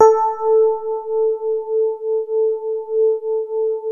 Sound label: DX7 MID1 A4, E-PIANO; Music Store